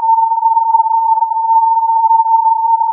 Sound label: filtered input